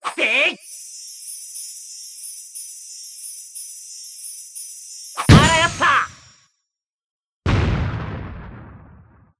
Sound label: coin, flame medal; goemon